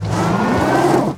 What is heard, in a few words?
Auto Tires Peelout Ice; Automobiles